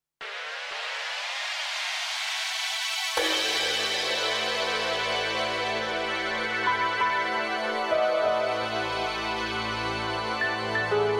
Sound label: Birds Fly (high); mixtures